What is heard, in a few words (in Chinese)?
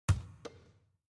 传球, nba; 战中